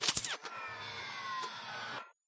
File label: alien blaster Reload